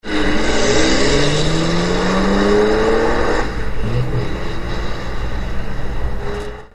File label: cars fast